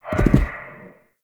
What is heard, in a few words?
Stag Stampede Gallop; Powers